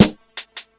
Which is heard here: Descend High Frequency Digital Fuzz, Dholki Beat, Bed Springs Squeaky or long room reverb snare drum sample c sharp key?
long room reverb snare drum sample c sharp key